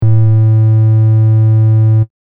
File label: triangle